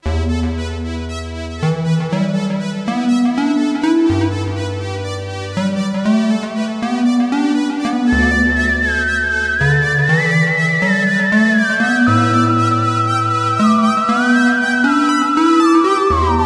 Teisco S100P Multitrack SYNTH ACCORDEON WHISTLE

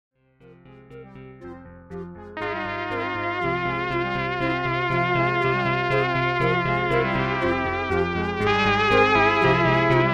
Elektron Digitone Keys

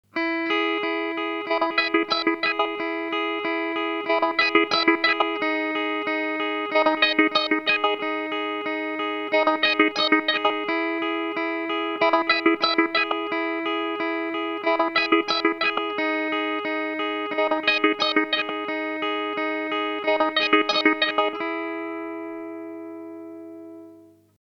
Boss SL-2 Momentary Switch

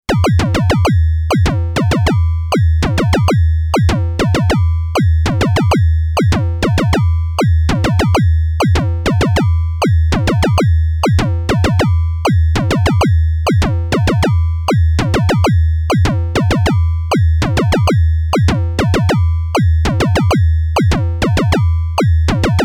Moog Subharmonicon Patch Modular Kicks

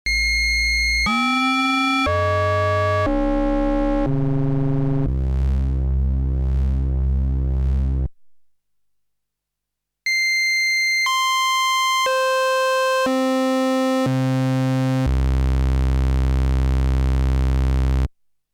Arturia Polybrute FM with and without Sync

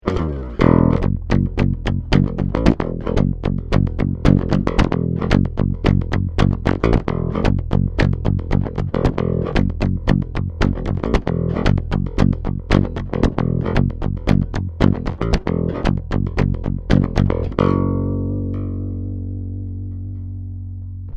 pick bridge

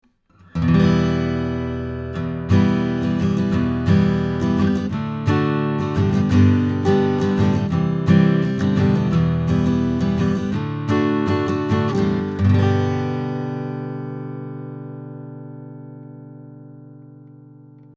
Oktava 115 Acoustic Guitar; Midas 502 V2

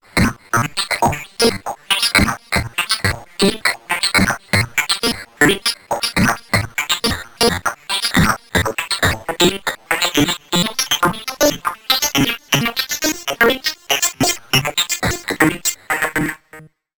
Continous Drum Beat; Soulsby Ocsitron

